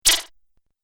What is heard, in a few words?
Laser Zap, Laser Gun